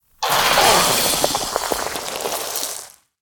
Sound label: Smothering Starfish Death; en